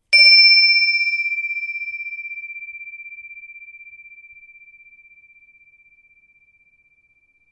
sky slice; saturn

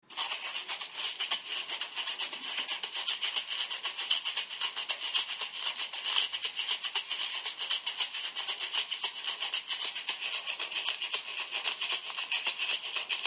Caxixi

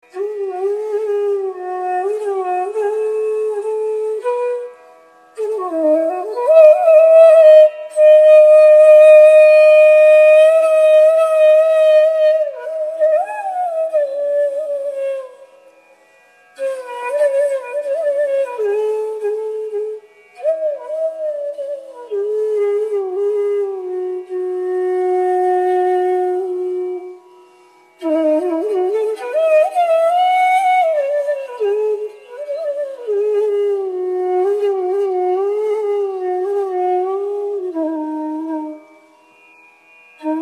Bansuri

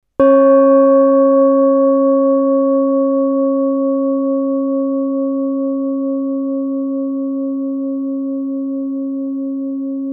GONG OBLONG; Erdenjahr

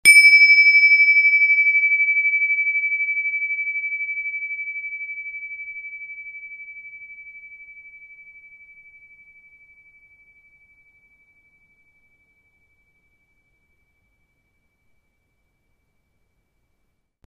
sky slice mars

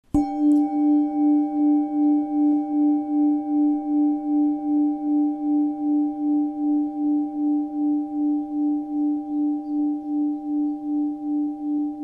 PLANET BOWL; Saturn

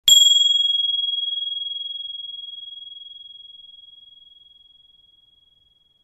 sky slice uranus

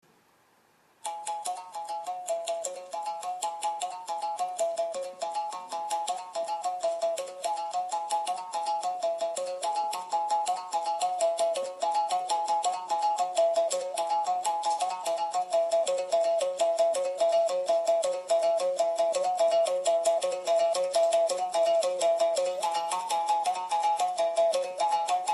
Mouthbow

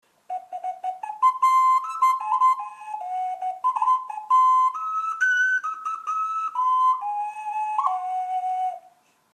Inka Soprano

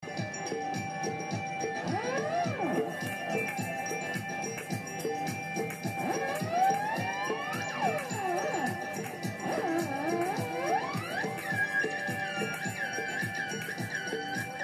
Theremin

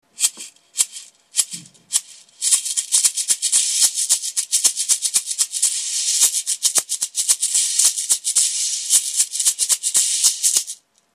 chekere gross

